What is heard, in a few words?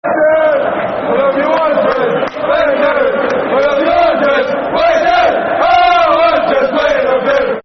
We Love You Wanderers, Football Chants; Wolves; wolverhampton wanderers; fanchants